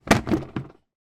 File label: household wooden drawer pull out come away from runner